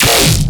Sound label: Bass Hit E, Dubstep; Gleam Bass; Ghosthack; AC2024 - Day 13 - Dubstep Sounds 2025